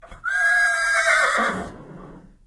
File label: Whinney grumble reply